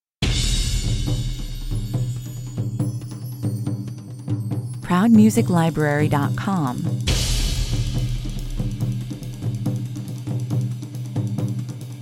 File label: marching, percussion only